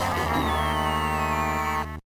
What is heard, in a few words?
Lose; MPA; Duel Dash